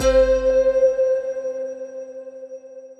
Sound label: bom prize normal; Great Book Of Magic Deluxe; Wazdan